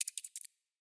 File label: Rats Chewing; Prison Architect